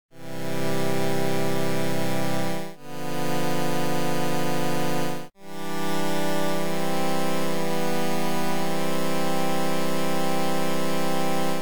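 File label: Battle End; Hylics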